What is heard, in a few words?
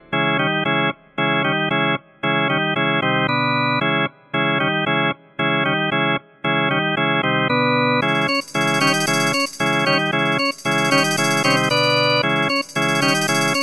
Vox Continental Combo Organ; Harmodion; Syntheway